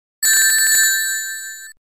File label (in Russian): potera kolca